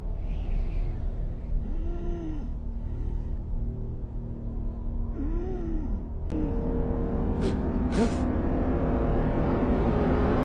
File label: wheezing; superman; audio meme